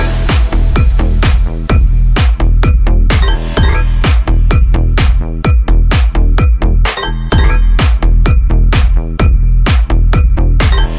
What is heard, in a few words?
Ringtone